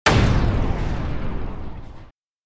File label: bomb bomb